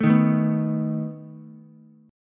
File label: A Suspended 2nd Flat 5th A down Ukulele; Asus2b5 strummed